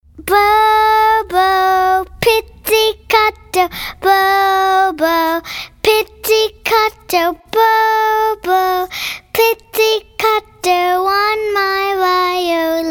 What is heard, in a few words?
Toddlers Sing